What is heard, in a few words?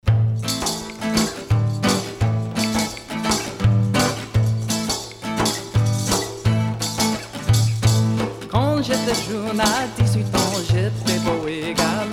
hanter dro bretagnese celtic french trio